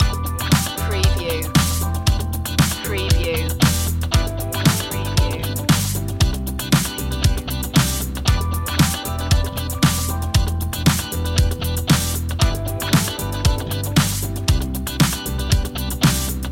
daft funk loop

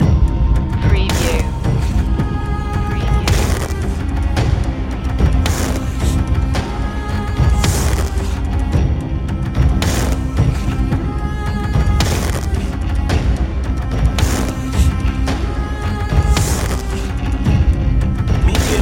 blackout loop

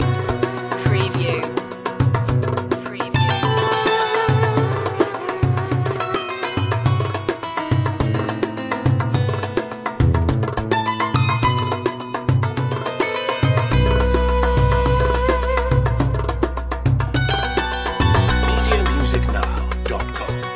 middle eastern oasis loop

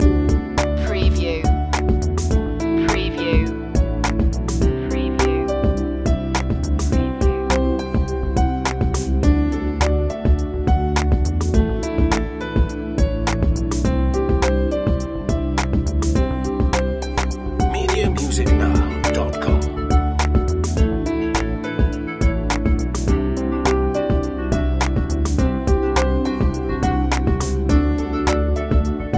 downtown groove loop

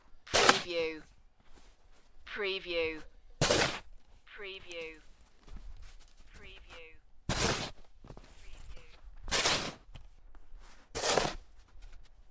Shoveling snow, medium soft snow

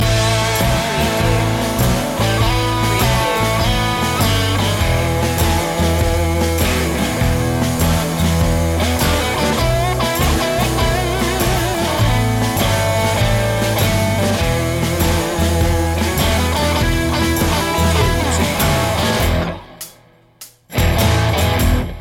delta sound loop

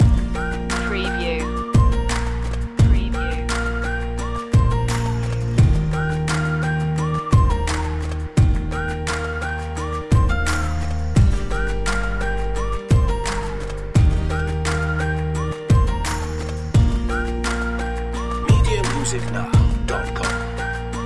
puddle jumper loop

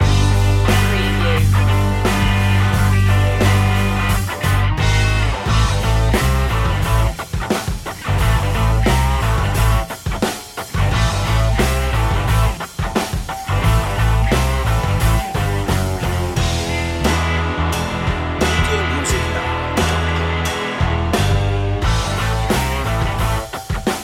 total trucker loop

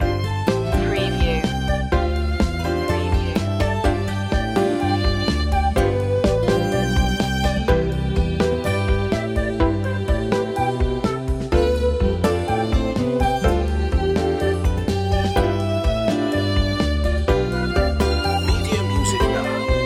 carefree day out loop

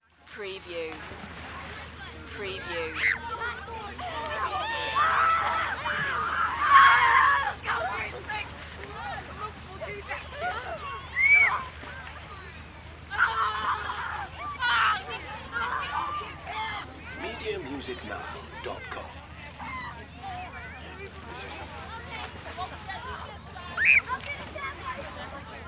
Beach Crowd; sound-effects-bible

